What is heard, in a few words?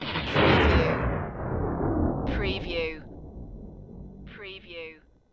Thunder